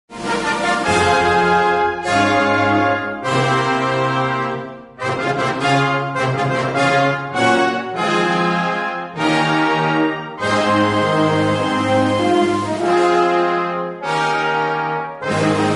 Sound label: Fanfare